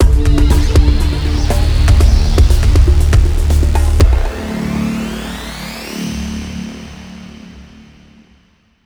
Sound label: Spawn Short